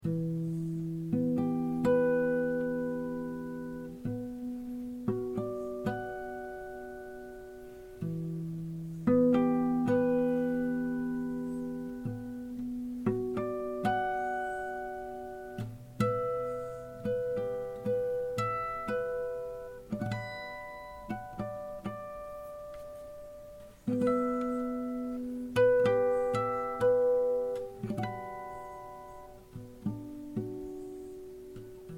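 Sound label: Em 120 guitar harmonics impro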